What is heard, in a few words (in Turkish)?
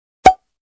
Gazoz kapagi acma mesaj sesi